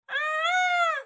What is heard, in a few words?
peacock